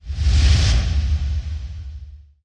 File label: spreading Wild; wild wild west